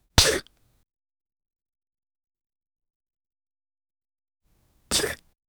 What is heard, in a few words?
french kiss, romance